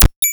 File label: Interface Snare; Cwejman S1; DevSnd Cwejman S1 - JK